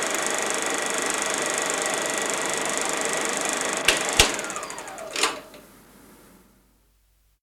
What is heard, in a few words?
RECALL END SOUND; the 39 steps